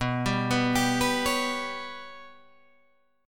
B Suspended 2nd Sharp 5th down Guitar Drop D

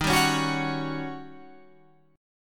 Eb 7th Sharp 9th, Eb, Guitar, Open F; strummed; 15,14,13,13,16,13

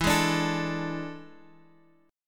A Major 7th Suspended 4th E

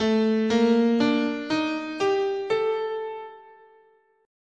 A Iwato, scale

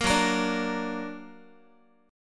F sharp Augmented F sharp Guitar; 13,14,13,12,x,x; strummed

